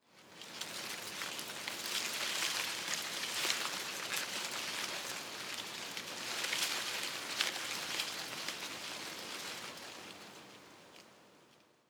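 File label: tree small leaves rustle soft, garten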